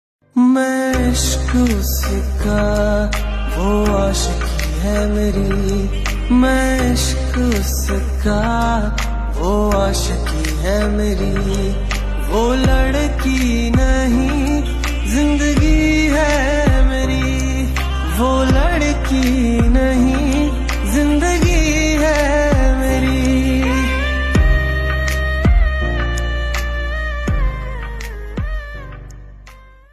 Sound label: Most Heart Touching Sad Song Ringtone